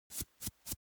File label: object makeup stroke apply dab